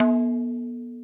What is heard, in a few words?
madalam notes L